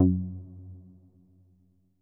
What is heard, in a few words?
F#1, SYNTH WAVES; Music Store Sound Service; Einstein